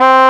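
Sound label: synth, Drum & Bass, 160 BPM; Ueberschall; Drum & Bass Flash Trackz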